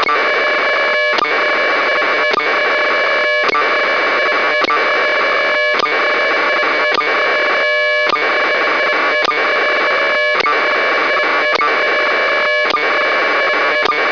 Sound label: compass afsk test